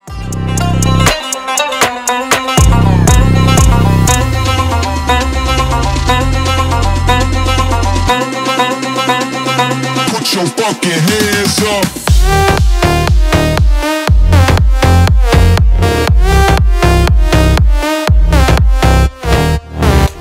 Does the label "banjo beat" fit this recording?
Yes